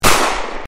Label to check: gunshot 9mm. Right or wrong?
Right